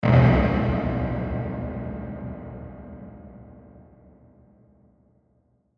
Hit Ring Dark Reverb, Hit Harsh Pound Reverb; Blastwave

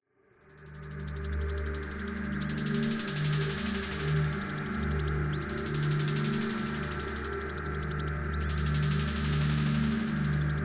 Drone Sweeper Metallic Feedback; 5.1 STEREO MIX; Blastwave

